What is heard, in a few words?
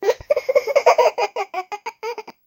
generate a soft and joyfu, baby laugh